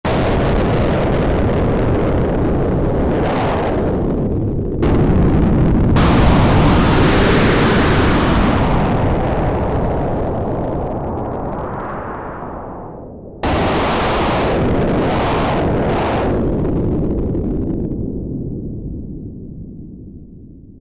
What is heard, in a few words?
Thunder, Korg MS2000; Factory Patches